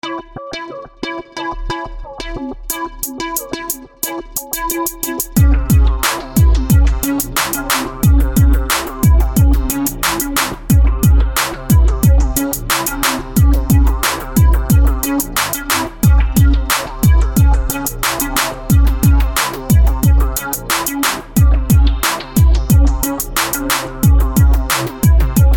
beat

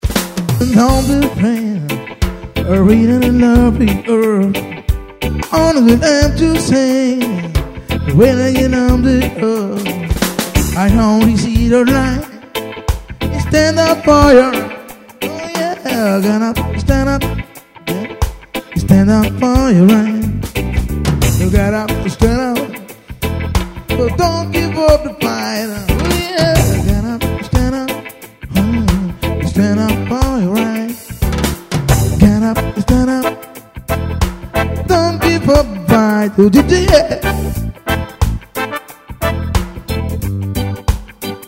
dub mixer

